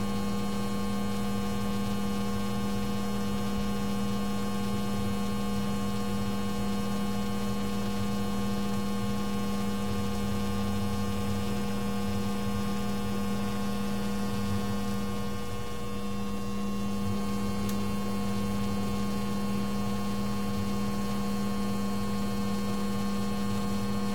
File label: DOTS on loop; Phasmophobia